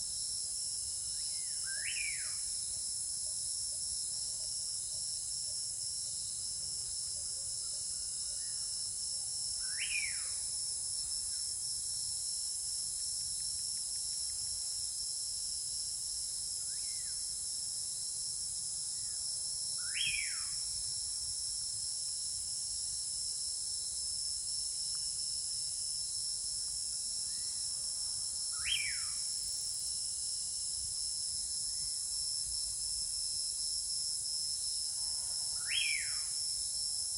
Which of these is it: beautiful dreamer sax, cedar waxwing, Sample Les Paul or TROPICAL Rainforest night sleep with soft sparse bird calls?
TROPICAL Rainforest night sleep with soft sparse bird calls